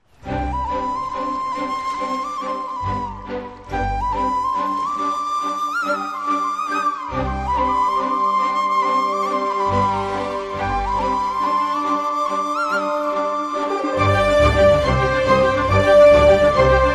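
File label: background flute ringtone; Dhadak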